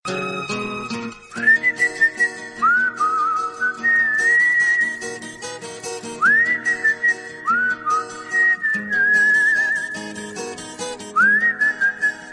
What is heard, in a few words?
romantic melody